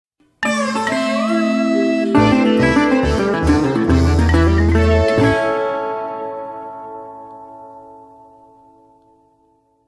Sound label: Reward